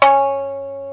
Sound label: shamisen C2#; AKAI S6000 CD-ROM - Volume 1